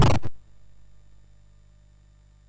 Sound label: MOS6581R2, sid; Defenders of the Earth